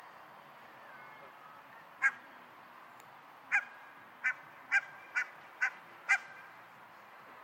Barnacle Goose Branta leucopsis

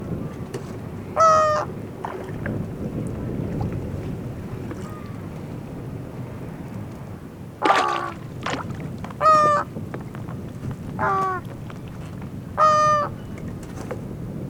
American Flamingo Phoenicopterus ruber

